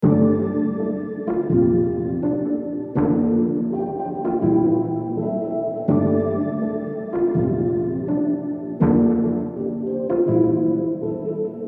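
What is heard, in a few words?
D minor 164 BPM Piano Keys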